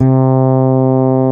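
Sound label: rock fretless C3; Drums In Your FACE!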